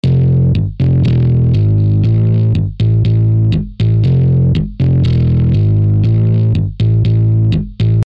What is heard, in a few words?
Wet